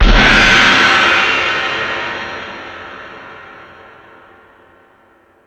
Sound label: Crash, Drum Machines E-MU Pro-Cussion